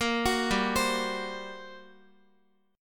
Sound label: G Minor Major 11th, G, Guitar; GmM11 arpeggiated; JGuitar